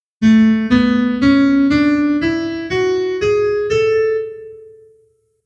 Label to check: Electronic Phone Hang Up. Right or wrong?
Wrong